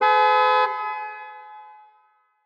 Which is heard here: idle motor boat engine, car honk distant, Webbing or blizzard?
car honk distant